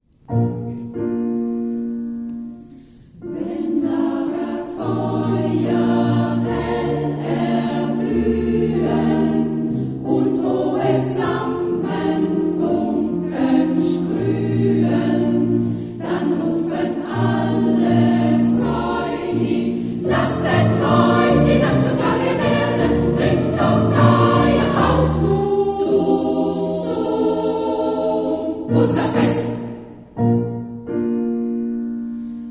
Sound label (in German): Damenchor Ungarischer Tanz Nr. 6; Sulgen